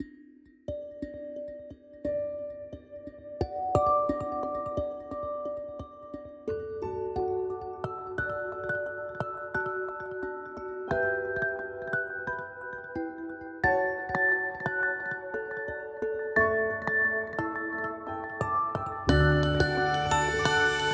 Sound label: Music Box, alarms; Honor Pad-X7